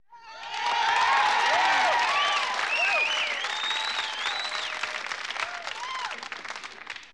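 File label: Crowd 100 Interior Canned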